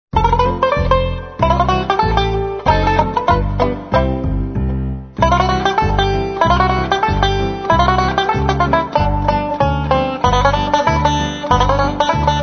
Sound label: ragtime banjo